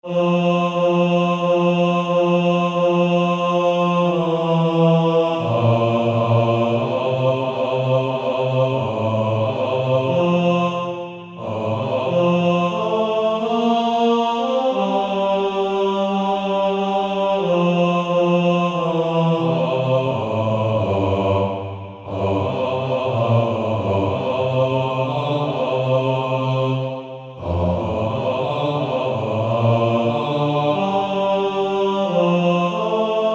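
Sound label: Male Choir, bass; Onward Christian Soldiers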